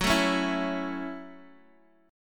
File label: Gb Minor 7th Gb Guitar DADGAD; down; chord shape